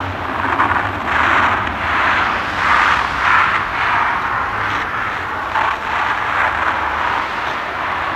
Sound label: Crystal Wind; ambience